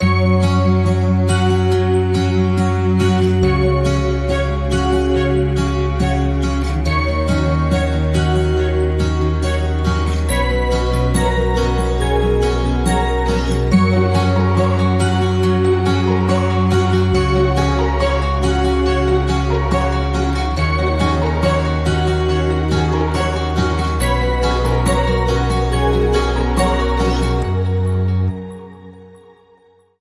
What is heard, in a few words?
Joyful Acoustic Christmas No Drums; 30sec